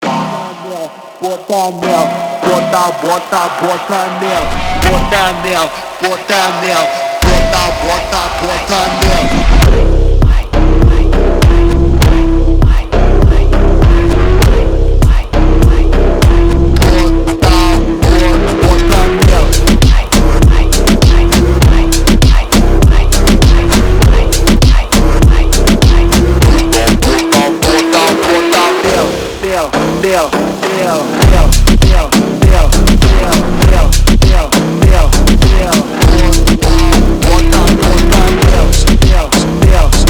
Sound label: brazilian phonk